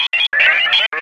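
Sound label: sphere blips large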